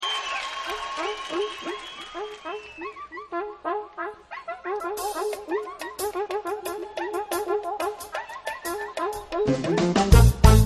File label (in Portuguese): samba chic